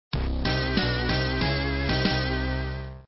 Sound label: meadows game over; apidya